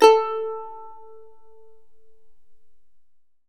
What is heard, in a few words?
CHARANGO A2, The Andes